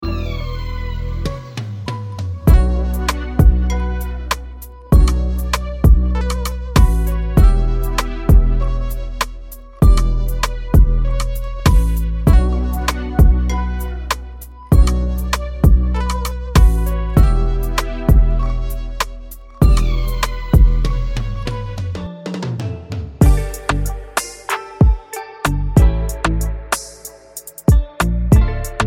Afrobeats; Major Loops; Motion

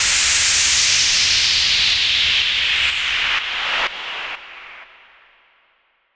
FX Downlifter

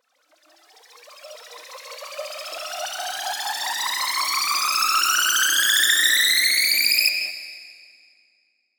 FX Uplifter

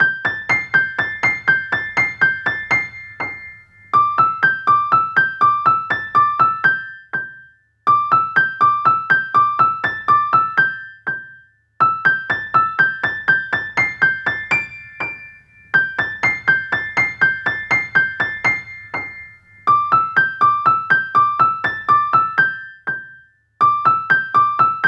Piano Notes Amin

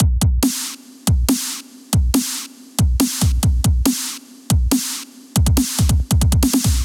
140 Kick Snare

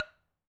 Woodblock Low Soft

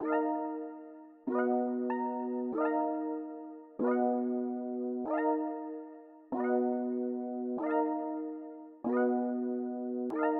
Pluck 95bpm D

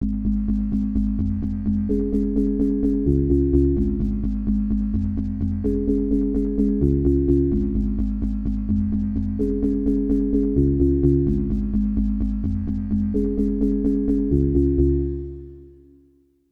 Leads Muted Sine Plucks 128bpm C-

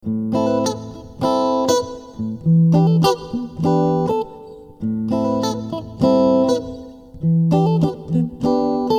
rnb guitar a minor 100bpm

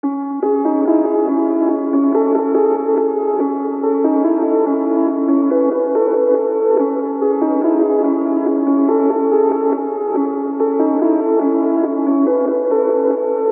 Synth Melody 142bpm C- Trap Drill

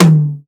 tom

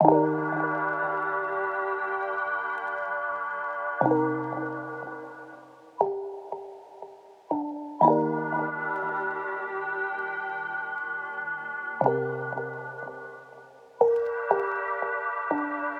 120 songstarter intro Gm

